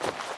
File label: Idle leather small